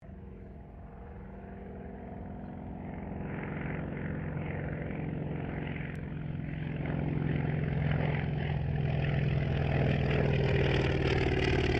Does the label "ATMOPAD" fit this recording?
No